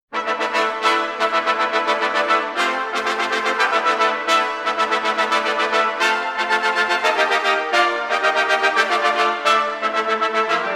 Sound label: Fantasia for Seven Trumpets; Music for the Soloists of the ABQ